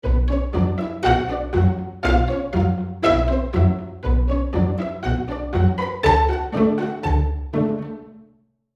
Studio Large, pizz; Hall of the Mountain King; Spitfire

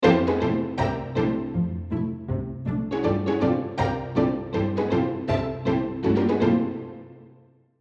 Pizzicato String Ensemble; Logic

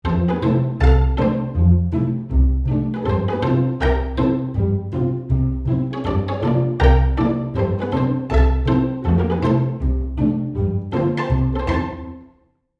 Studio Small, pizz; Spitfire

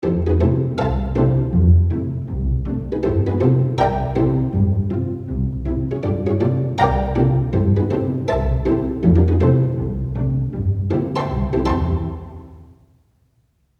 pizz; Spitfire Aperture

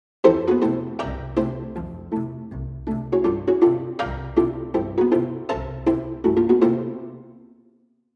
Solo, pizz; Cinesamples

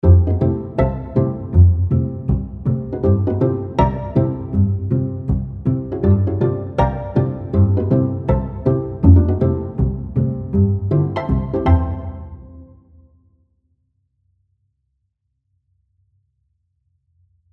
Solo, pizz; Embertone

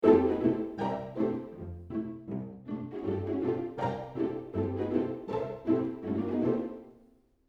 pizz; Hollywood Diamond

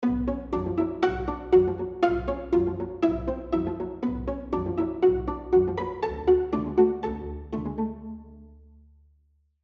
Solo, pizz; Hall of the Mountain King; Cinesamples

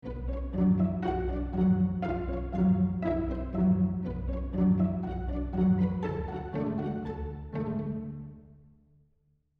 Symphonic Orchestra 60 Piece, pizz; Hall of the Mountain King

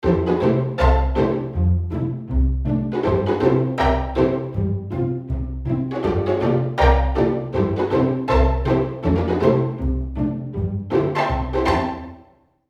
Studio Large, pizz; Spitfire

